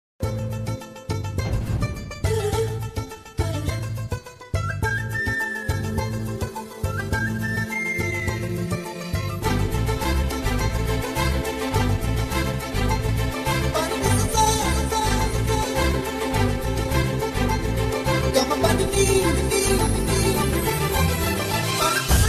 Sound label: love bgm ringtone, tamil bgm; santhosh subramaniyam; jeyam ravi